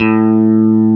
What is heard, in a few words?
GUI STRATO, E-Guitar; E-GUITARS